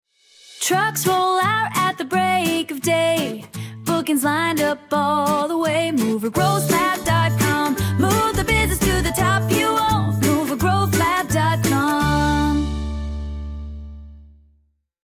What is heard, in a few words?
jingle